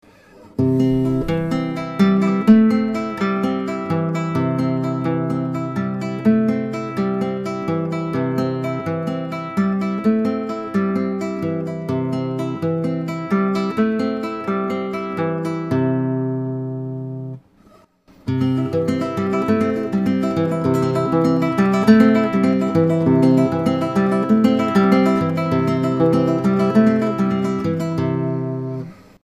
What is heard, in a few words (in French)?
arpeges, guitar